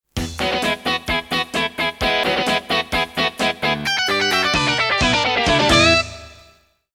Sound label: AWE32; Raptor